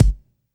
bumpin kickdrum g key, Royality free kick single shot tuned to the G note